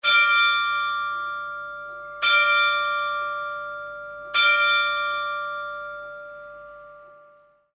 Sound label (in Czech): zvon marketa; zvonohra